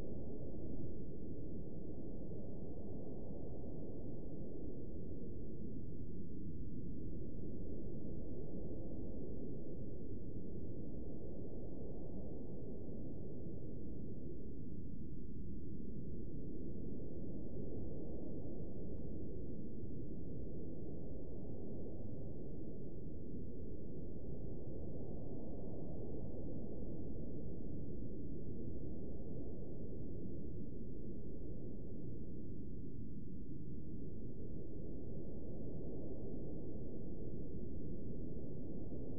Wind; DeadFright

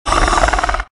Velociraptor - Growl; RadwinIsland

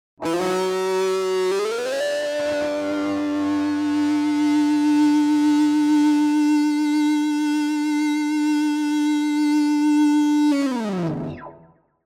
Rock Guitar Distorted - Long Sustain Tone; Hollywood Edge; sound designer's toolkit v2

